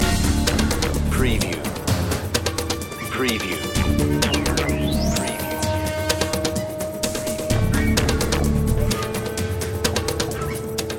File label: digital voodoo loop